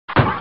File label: hit